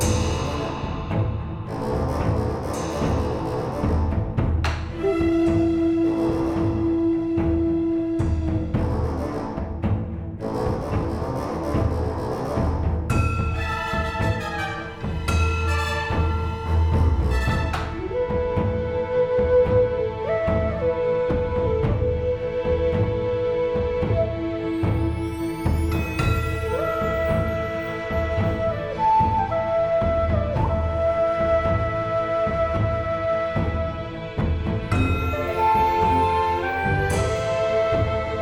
Gameplay Loop; Almightree The Last Dreamer

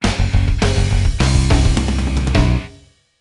Sting Player Down; X-Men Origins: Wolverine; NDS

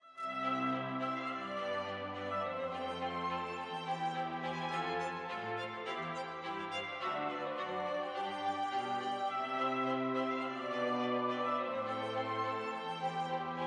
Quartet Distance 105bpm; Chili Inc

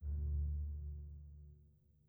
chime old; PS4; Chime Sharp

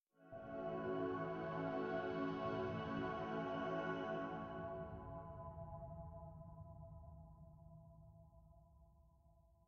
constellation Puzzle Success; Desert SFX

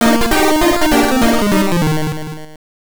Unused Jingle; Tom and Jerry: Mousehunt; Game Boy

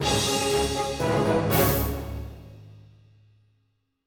Music Fight Theme Easy 120bpm End; asterix obelix slap them all 2; Romans

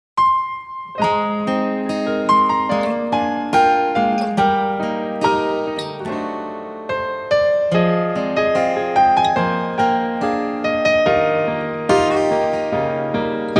Violin & Piano & Guitar Version

